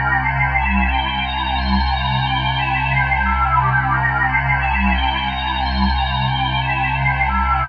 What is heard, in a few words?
pad c, Synth Pads, Chillout; Best Service ProSamples vol.36 - Chillout